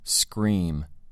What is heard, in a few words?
scream